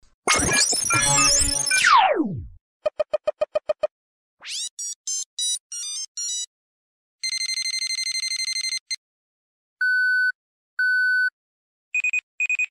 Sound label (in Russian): zvuki dlya gologrammy